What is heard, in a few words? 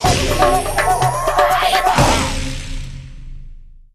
STAB; Jungle Party; psp